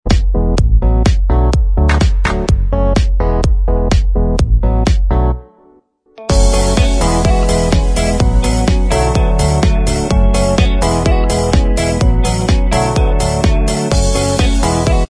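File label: instrumental, backing tracks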